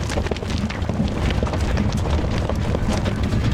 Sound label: Auto Tires Rock Dirt Loop; Automobiles